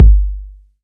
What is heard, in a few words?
rich low end kick one shot G key